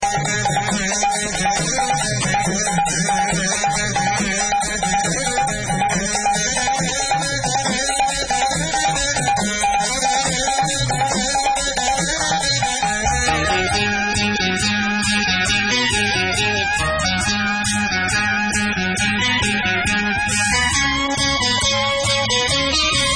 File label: tamil tone